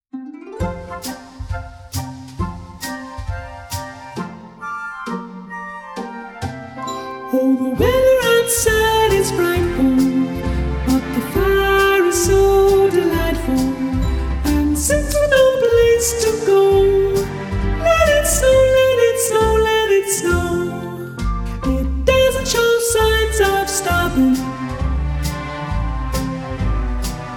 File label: SOPRANO